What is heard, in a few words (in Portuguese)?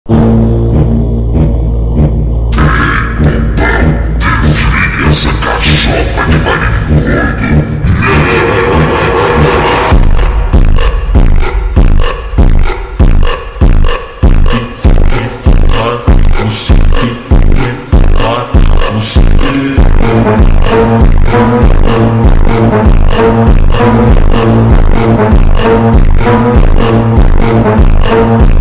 risada do senhor slow version